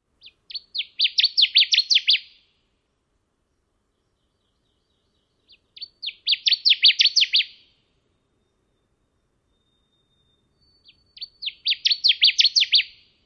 songs

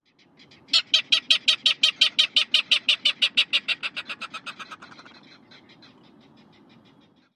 grunting outburst of California subspecies

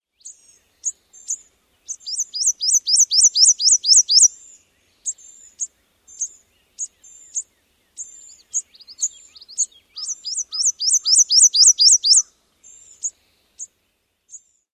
songs with calls

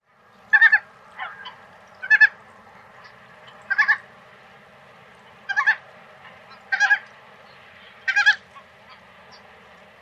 calls of individual

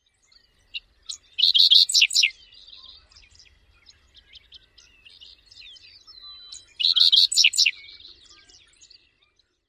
song

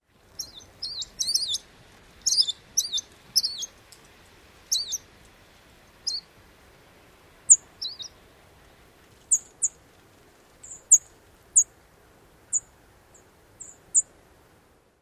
jingled notes amp tseet calls

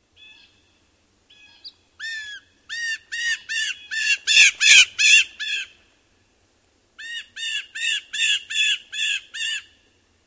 possible blue jay imitation

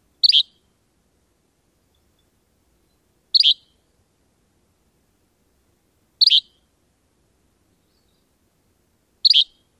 song

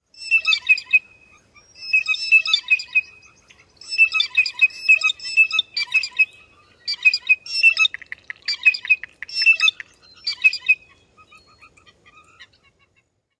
piping calls of courting group

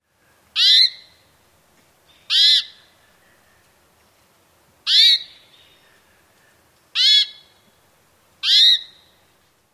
way calls; NY

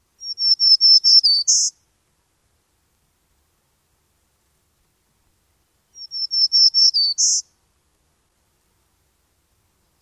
songs

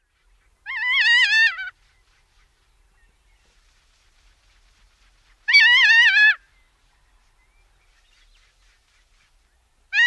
call

